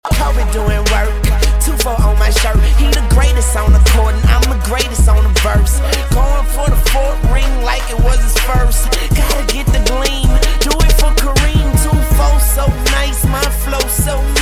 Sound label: Is Calling Ska